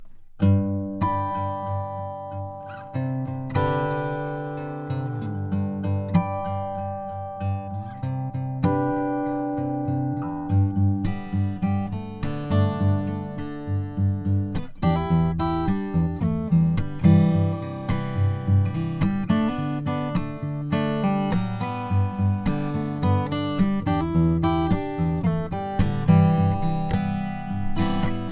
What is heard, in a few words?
wingert e12s indian rosewood